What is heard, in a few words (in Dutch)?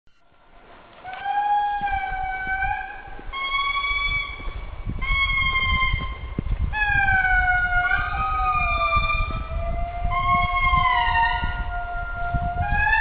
interresant geluid, Madagascar; Madagascar 2007